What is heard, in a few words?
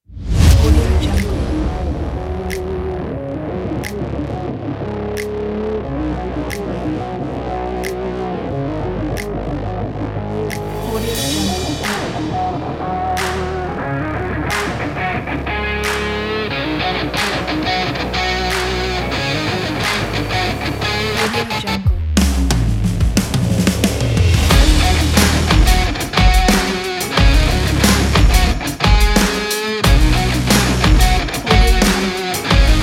action promo indie